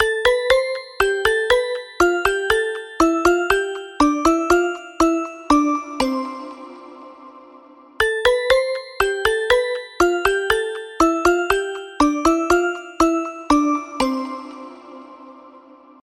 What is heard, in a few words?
Cheerful Xylophone